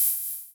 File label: hi hat